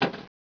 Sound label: BUTTON